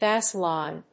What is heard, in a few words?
bassline